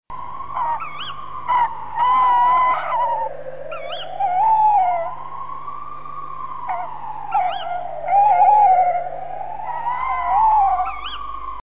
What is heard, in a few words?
Tawny Owl